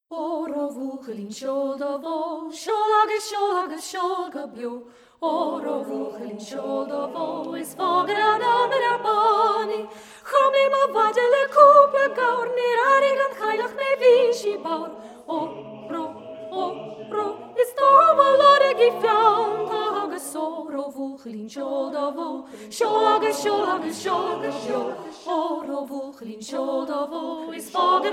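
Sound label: Oro Bhuachaillin seol do Bho Soprano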